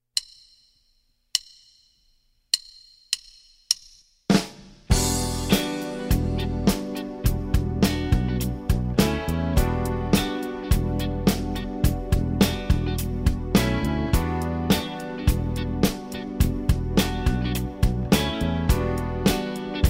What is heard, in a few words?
with drums